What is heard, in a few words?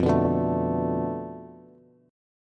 F 6th Flat 5th F down Bass, chord; F6b5 strummed